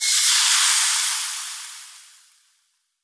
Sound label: fireball impact magic smoke